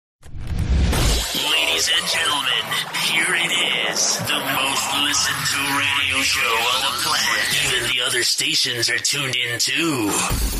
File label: jingle